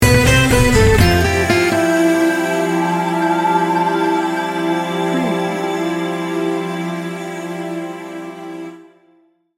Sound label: heroic drama action sting bumper